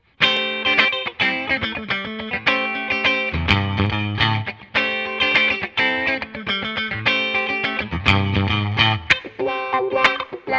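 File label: Clean no bright tele wah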